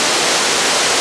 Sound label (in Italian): Acqua spruzzo